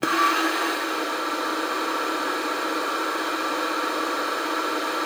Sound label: luft, alto sax; Xsample VOL-2